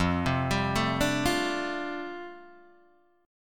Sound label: F 6th Add 9th F down Guitar Open D, chord